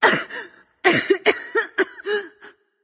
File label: clear throat light; smite; voicepack; hel